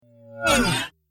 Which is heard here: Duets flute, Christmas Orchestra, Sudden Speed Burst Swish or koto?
Sudden Speed Burst Swish